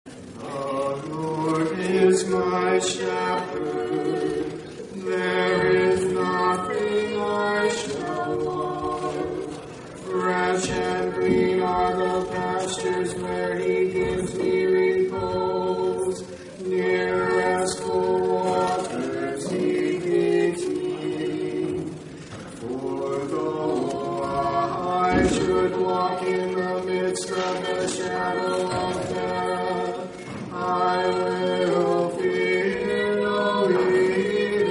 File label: Communion Antiphon